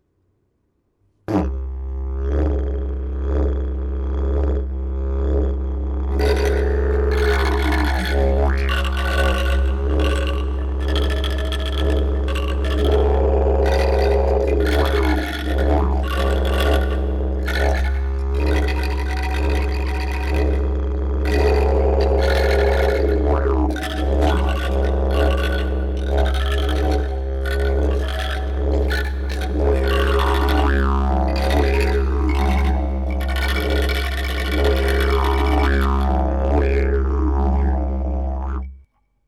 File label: Didge Lang Syne